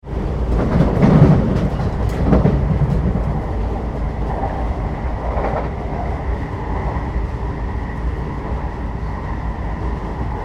Train Electric Interior Air conditioned Travel at Speed; Trains; Tom Hutchings